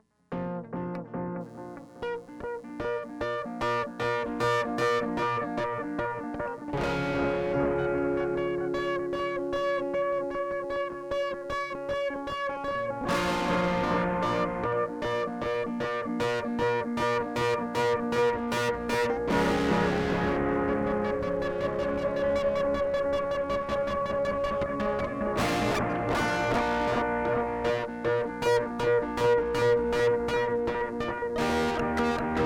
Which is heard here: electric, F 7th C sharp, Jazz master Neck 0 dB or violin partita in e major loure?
electric